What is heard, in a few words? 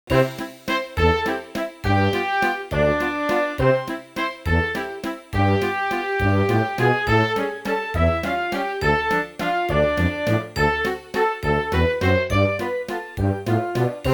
ballgame